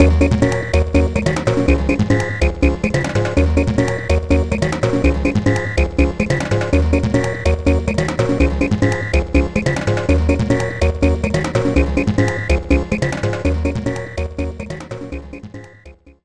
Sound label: jingle ~ invincibility star